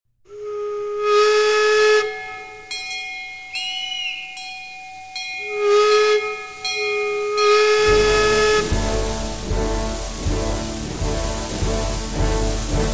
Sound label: fast polka train; kunzel